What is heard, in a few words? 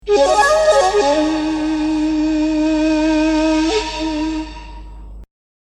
Panflute